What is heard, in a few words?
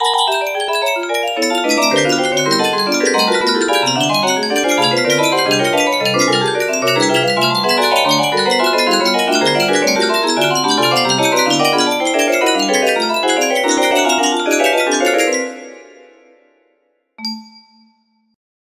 music box; meiko